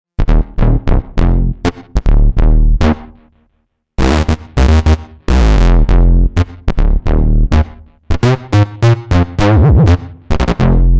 Squary Bass; uno synth pro desktop

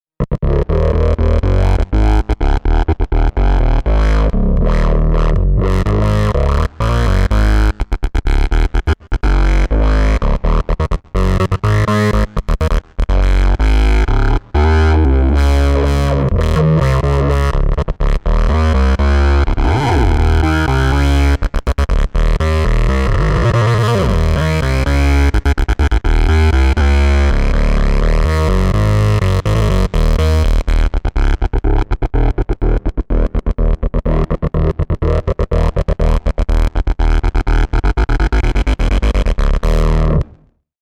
Bassy Mood; uno synth pro desktop

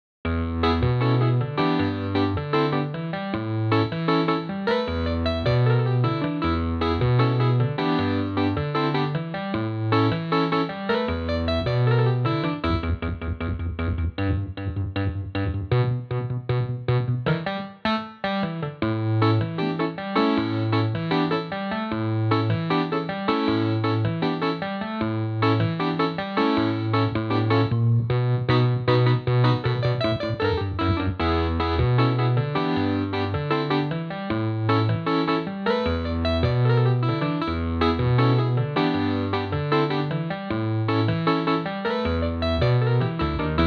wurly 120; sampletank4; electromagnetik

